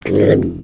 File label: Attack; Skullkin; creatures organic